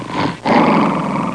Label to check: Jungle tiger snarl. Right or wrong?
Right